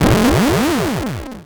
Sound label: Title Transition Ascend; Adobe; Production Element